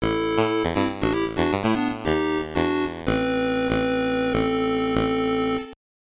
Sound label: Jingle; Shaq Fu; game gear